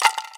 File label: RATTLE, INDIAN PERC; Didgeridoo and Other Primitive Instruments